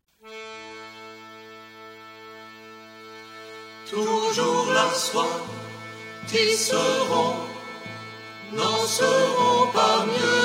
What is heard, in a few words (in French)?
chant des tisserandes